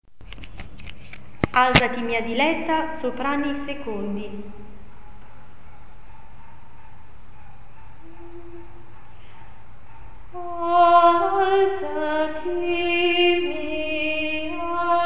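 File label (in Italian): alzati soprani secondi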